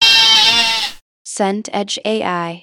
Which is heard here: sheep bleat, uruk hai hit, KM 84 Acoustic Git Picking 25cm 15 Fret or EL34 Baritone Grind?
sheep bleat